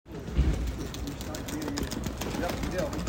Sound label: City Suitcase, Rotterdam; Rotterdam Field Recordings